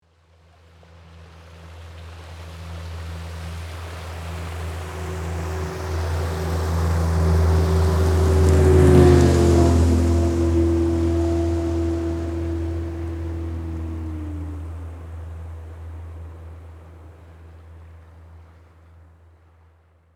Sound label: Motorboat passing by close perspective